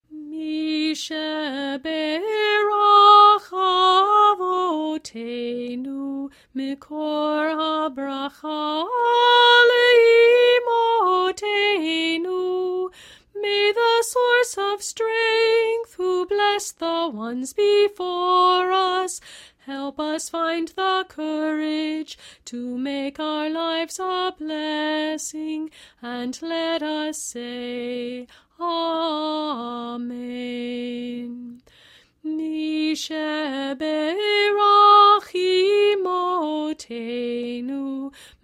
mi sheberach sung

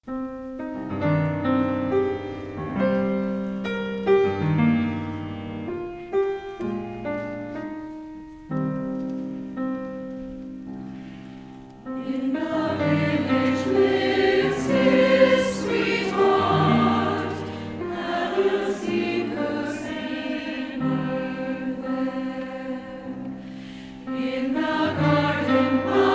Three Hungarian Folk Songs

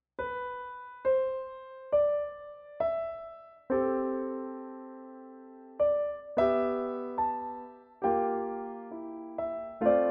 Danny Boy whistful piano

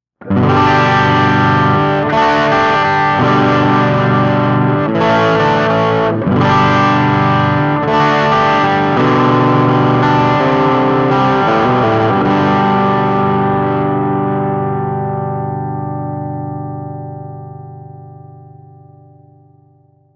Fender Telecaster 12 O'Clock Settings; EQD; Westwood

